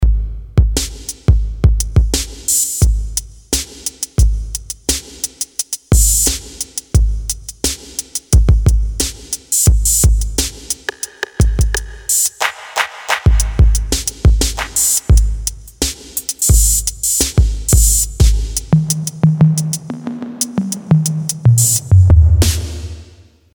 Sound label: Analog Kit; Yamaha PSR-550; Voice